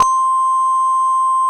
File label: Korg CX3, C 1; Back In Time Records; Korg Universe VOL-1